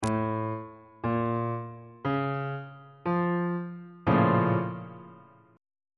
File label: a sharp minor major 7th 3rd inversion